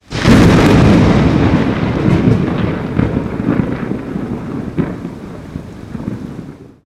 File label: element thunder; maoudamashii